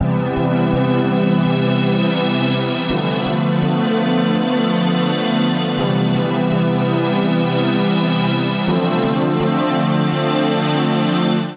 C Major 83 bpm; Accompaniment; Tonal; MIDI Clips; Serenity; Drive and Glow; Ableton; Factory Packs

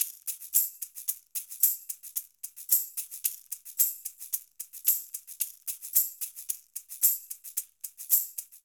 Funk Shaker Tambourin 111bpm, Latin Percussion; Funk 2; Ableton; Factory Packs

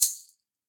SHAKER; Ableton; Factory Packs; Drum Booth

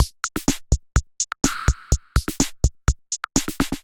Kit groove 125 bpm; MIDI Clips; 111 - 130 bpm; D55 Essence Kit groove; Ableton; Factory Packs; Drum Essentials

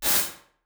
Swept Band Delay; Convolution; NI Kontakt 5

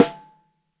Top, Snare

